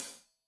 Hat Loose, Hi-Hat, Cymbals Stereo

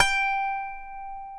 G4 DULCIMER; AKAI S6000 CD-ROM; WorldSounds

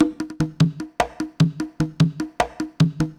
Johnny Congas, Percussion; Zero-G Smoke

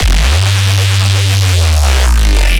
bass hit neuro glide G; Virtual Riot

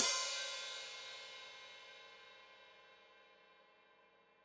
Hat Foot Open, Hi-Hat; Deeply Sampled Drums

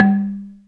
ZYLIMBA; Greytsounds VOL-1

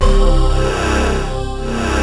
SWEEPING; E-MU Formula 4000 Series Vol. 3; Analog Odyssey

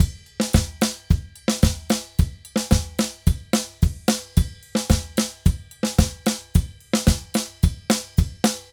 Drums Murga 110 bpm; Best Service; Festa Latina VOL-1

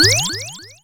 Reso fect, dry perc; Quasimidi Rave-o-lution 309

